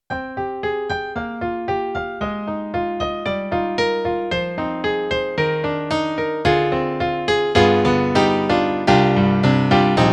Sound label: SD-80 Piano; EDIROL